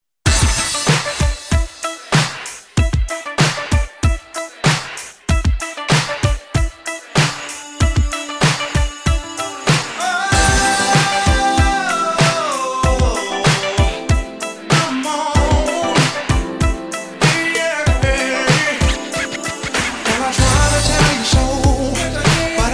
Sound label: music